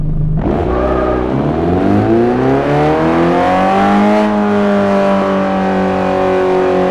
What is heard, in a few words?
rev, vehicles cars; tdmcars; c4